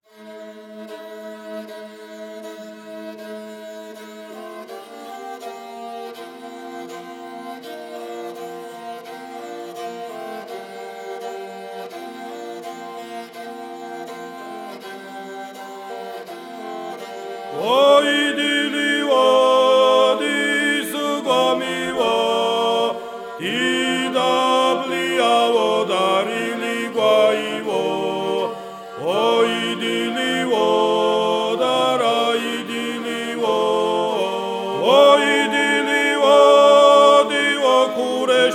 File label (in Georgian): ლაჟღვაში სვანური მეორე ხმა; სასწავლო; გალობის უნივერსიტეტი